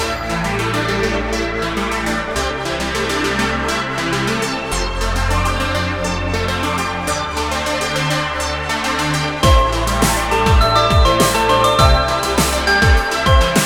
game music

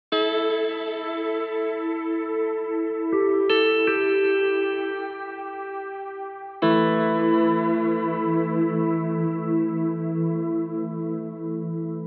guitar; Void Bastards; Krell

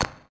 head pickup; gonner

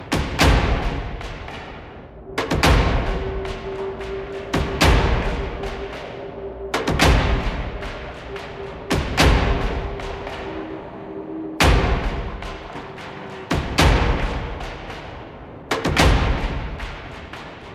Tense loop; WrestleQuest

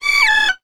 Beat

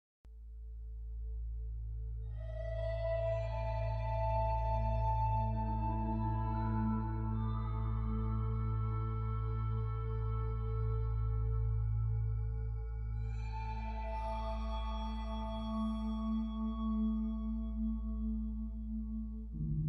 forest crash ambient tonal

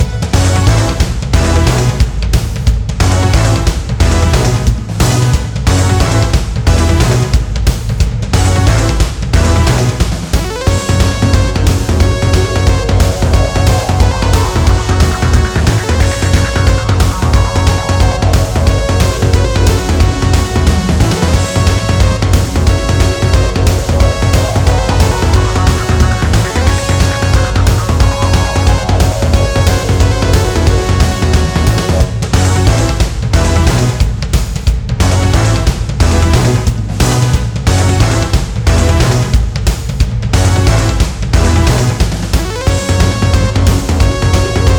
bgm boss

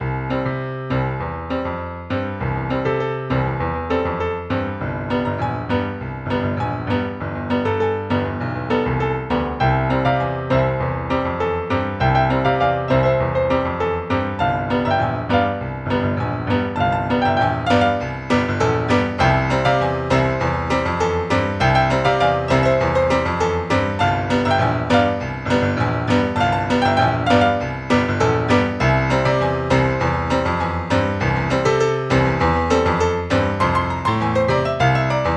Splendid Piano; simcity 2000

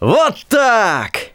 Emote Cheer; Twitch Skijump

